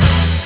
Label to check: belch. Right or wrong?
Wrong